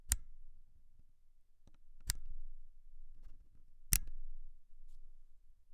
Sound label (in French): CHANGEMENT MODE